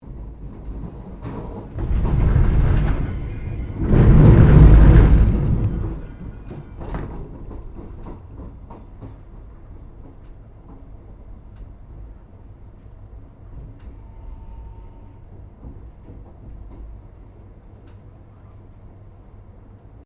Train Melbourne Passenger Xtrapolis 100 Interior Level Crossing; Trains